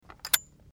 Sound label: Katana scabbard